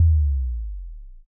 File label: kick